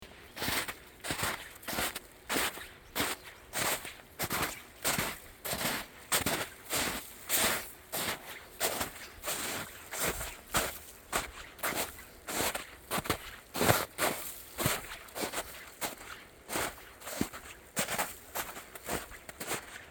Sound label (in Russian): shagi po snegu v gorah